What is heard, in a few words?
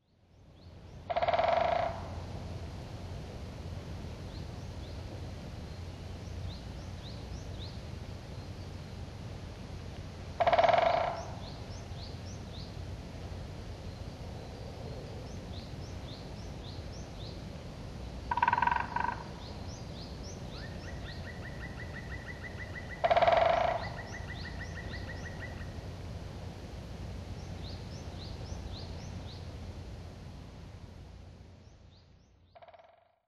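Black Woodpecker, tomakomai; kumagera; koe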